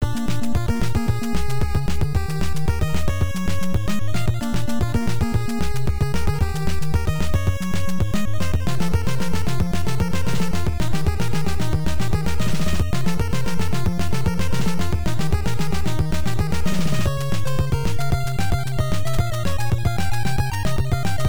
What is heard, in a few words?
Power-Up BGM; TwinBee 3: Poko Poko Daimaou; Family Computer